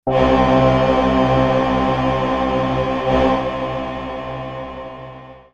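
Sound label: analog chorus; ecrins; Mars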